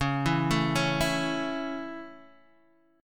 C sharp Minor Sharp 5th C sharp Guitar Open D, chord; x,4,2,3,4,2